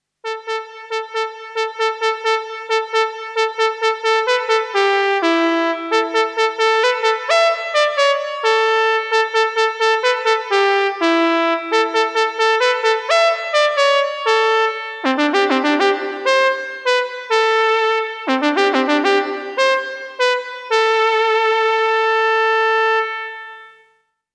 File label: ARP Trumpet